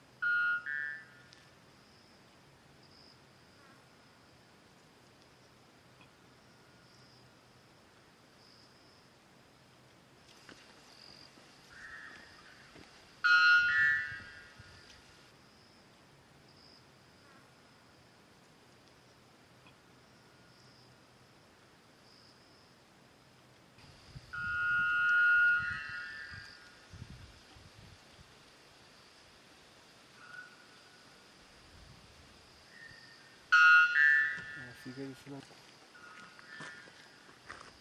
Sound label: white bellbird; پرنده بلبرد شکم سفید